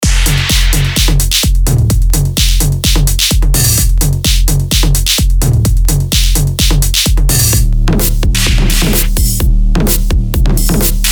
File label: Drum Depot Techno System